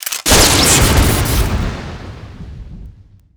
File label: powerup pickup crits; Garry's Mod; tf2